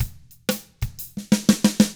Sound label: 122 SHUFLF, Double Platinum Drums, 122 SHUFFLED; ILIO